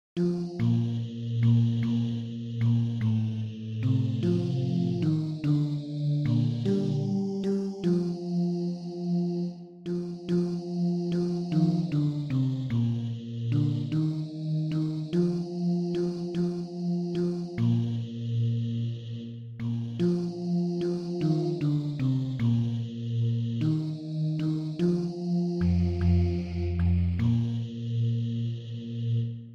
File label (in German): Kuehler Grund Grundform 3 stg Bass